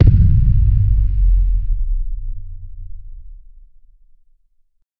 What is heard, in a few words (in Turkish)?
casino rulet dk frekansl